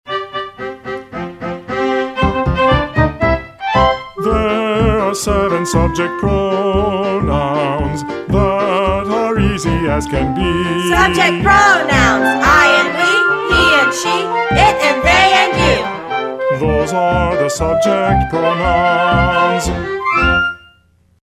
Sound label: The Subject Pronoun Jingle